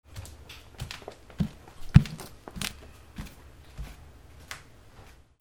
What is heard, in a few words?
FOOTFALL